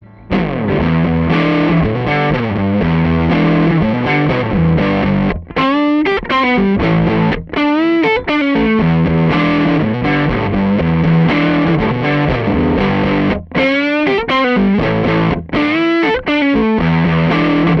riff AMP; machine modeling

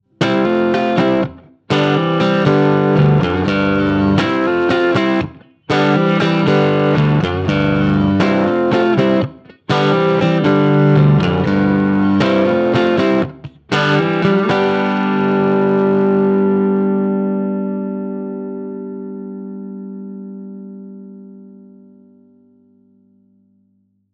Custom Champ Driven; AmpliTube; Fender 2; 真空管アンプ

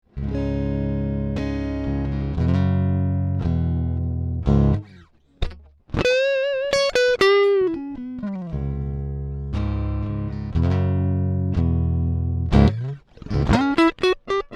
more gain unproc; fender

